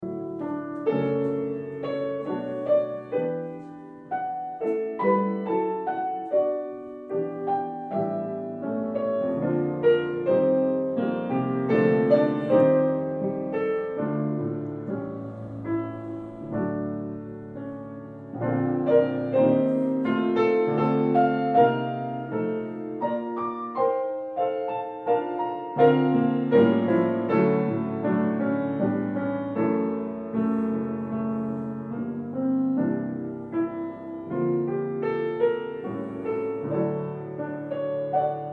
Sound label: playing his bluthner grand; bluthner grand piano; tally ho exam centre